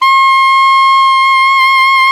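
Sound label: saxophone alto fortissimo; Roland - LA Composer vol. 1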